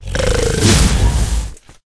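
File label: Rat attack up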